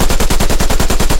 loop besa origin; guns tanks; War Thunder

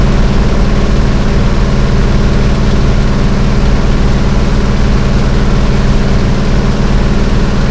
hellcat cockpit r, engines; War Thunder

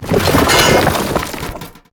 objects, World of Tanks

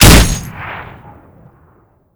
50cal

